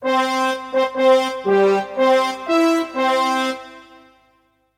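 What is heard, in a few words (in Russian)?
звуки фанфар и торжественного награждения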